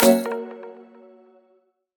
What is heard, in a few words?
menu direct click; JesusOmega {NM} 『Planets』